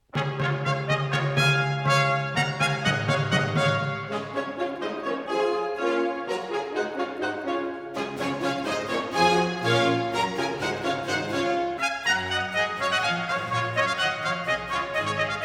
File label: Water Music Suite No. 2 in D major Bourrée; English Baroque Soloists, Sir John Eliot Gardiner; Suites for Orchestra HWV. 348, 349, 350, "Water Music"